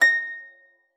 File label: harp forte; Xsample VOL-8